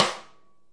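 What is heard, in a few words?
snare A3, multisampled real kit; REAL LIVE KITS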